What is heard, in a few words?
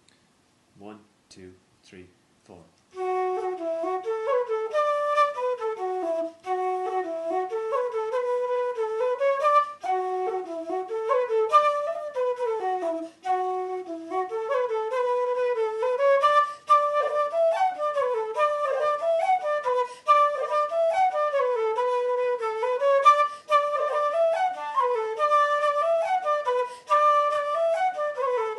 polka in d, Bouzouki Accompaniment; Billy Mag Fhlionn